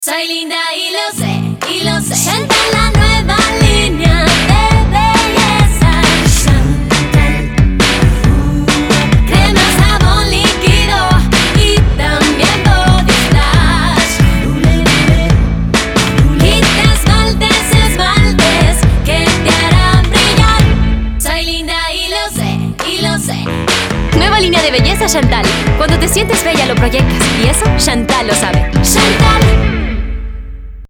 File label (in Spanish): Jingle; Shantal 2016